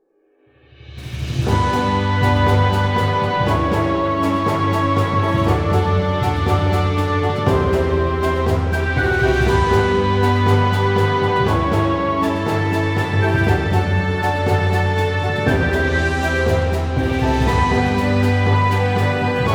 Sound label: Main Title; Randy Rednose